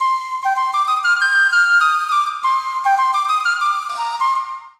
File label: flute